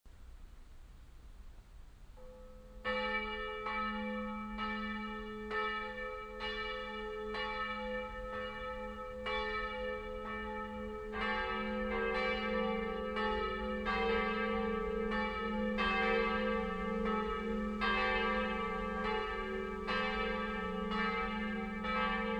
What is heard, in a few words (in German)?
Tonaufnahme g1 a1, glocken